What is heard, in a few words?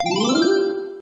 success; pou